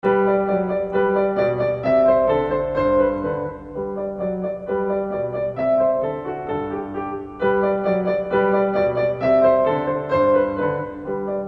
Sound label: Russian Folksong; Op. 107, No. 3; Beethoven